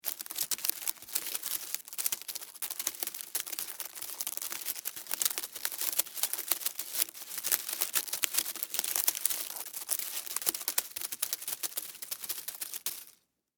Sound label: Insect Walk Tree, Insect, Walk, Tree, Foliage, Movement, Bug; Sound Effects:Animals:Insects; Blastwave